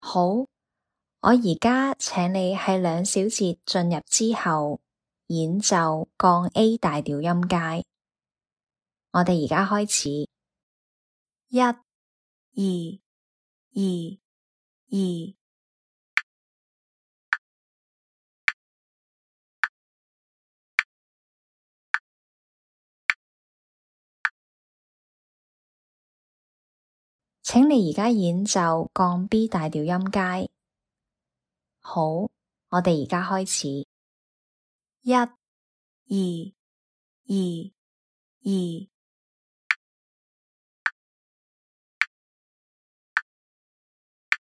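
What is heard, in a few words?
A flat major scale B flat major scale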